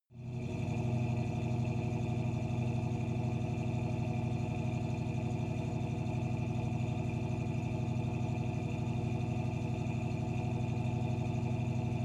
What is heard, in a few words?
car camaro idle faster exhaust